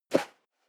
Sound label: step high heels sand single left; schritte